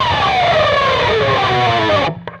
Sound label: PRS SCRAP, Guitars; PRS SCRAPES